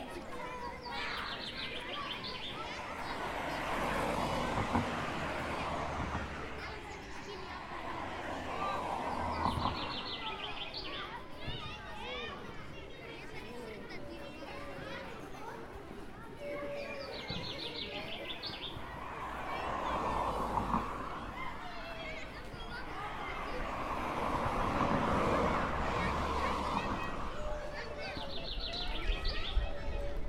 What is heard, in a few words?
Ambience Park